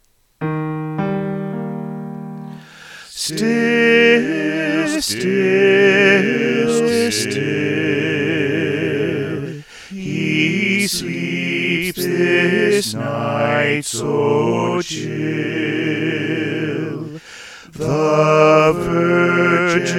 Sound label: Soprano; Still Still Still